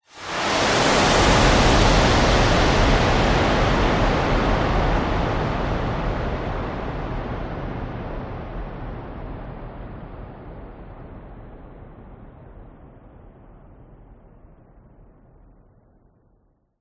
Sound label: whoosh; orlando lanzini